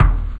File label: Percussion; Vengeance Samples